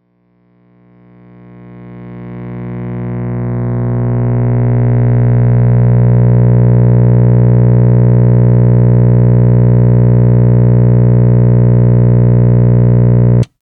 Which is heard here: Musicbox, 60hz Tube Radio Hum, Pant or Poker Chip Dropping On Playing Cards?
60hz Tube Radio Hum